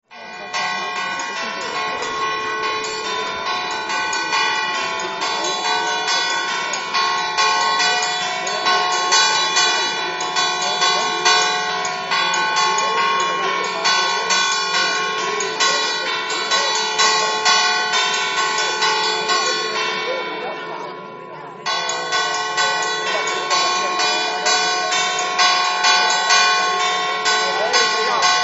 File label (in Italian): rozinca, campane